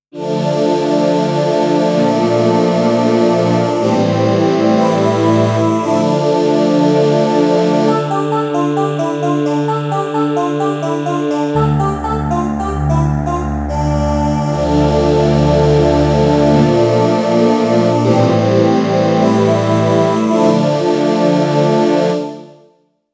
synth choir

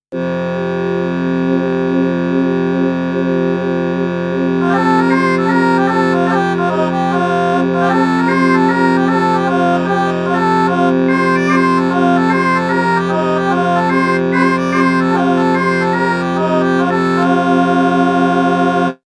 hurdygurdy

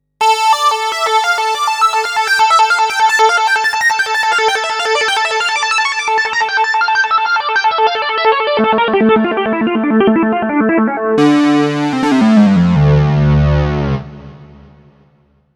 mini lead

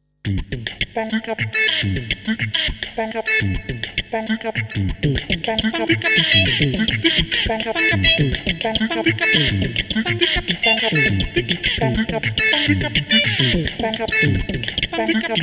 rhythmical

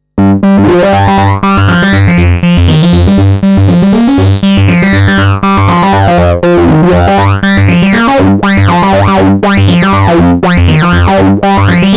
distortion mod

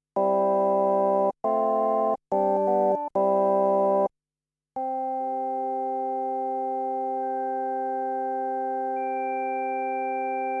organ harmonics

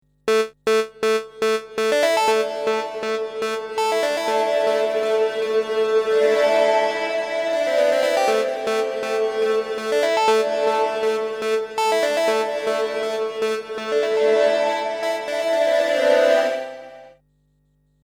reverb modulation

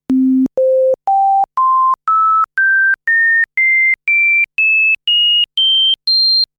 sinewaves

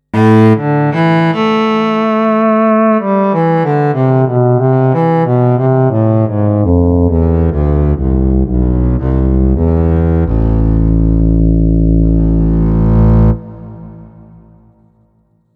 synth cello

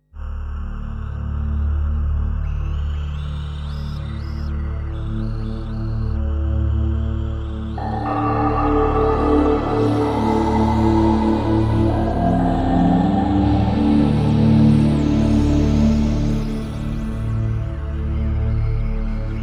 deep atmosphere

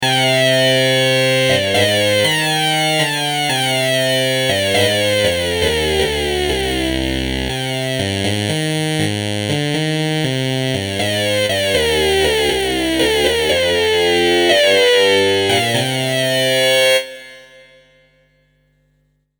hard sync with 2 osc

